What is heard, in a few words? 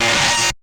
Electro Troll; martyn stonehouse